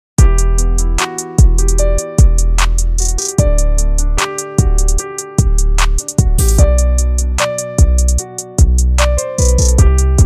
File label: Drums Bass Piano